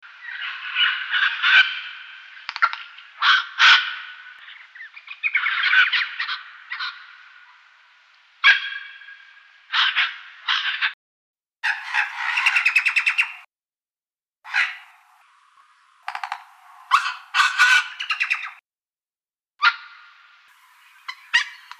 red wattlebird; honeyeaters; canberra garden birds

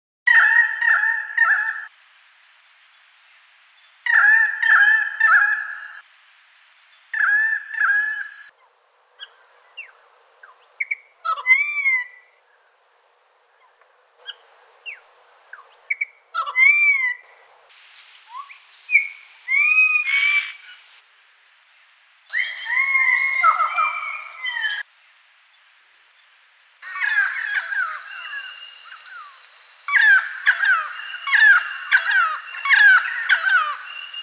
pied Currawong; woodswallows butcherbirds currawongs and magpie

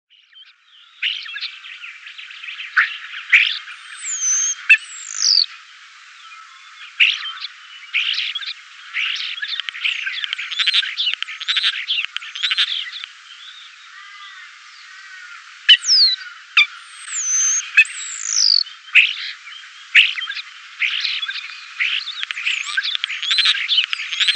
Common Starling; thrushes starlings and bulbul

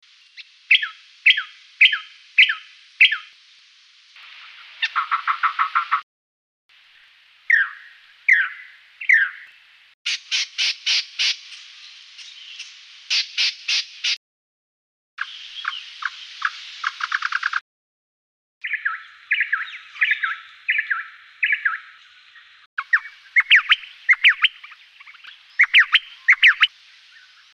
white Eared honeyeater

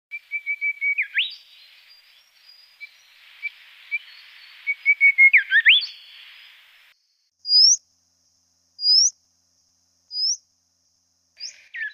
golden Whistler; babblers sittella whistlers and flycatchers

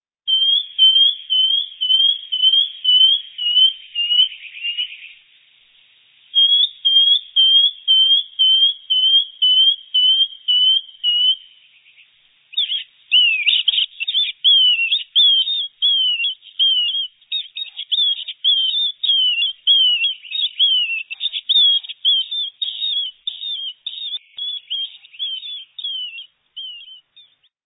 shining Bronze Cuckoo; canberra garden birds

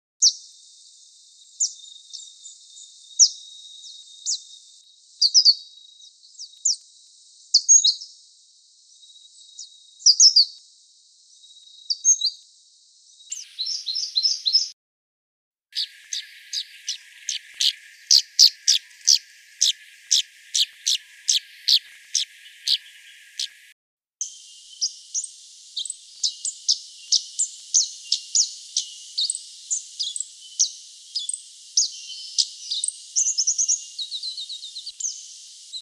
mistletoebird; mistletoebird flowerpeckers; canberra garden birds

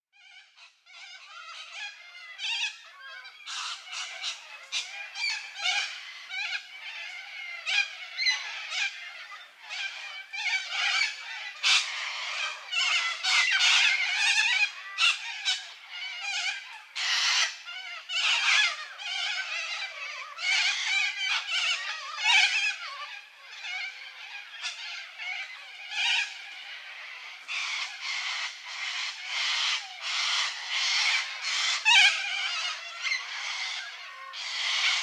Little Corella, cockatoos

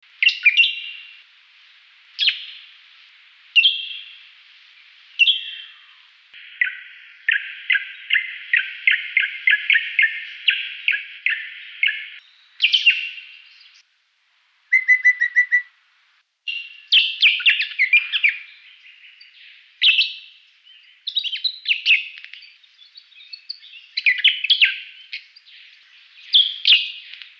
crescent honeyeater; honeyeaters; canberra garden birds

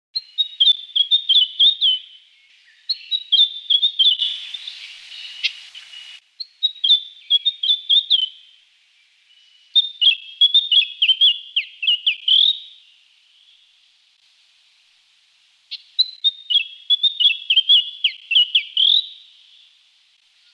flame Robin; robins; canberra garden birds

